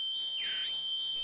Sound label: Scanner